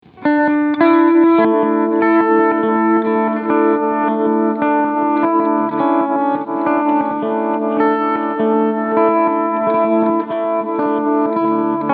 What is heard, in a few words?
Joyo Aquarius Reverse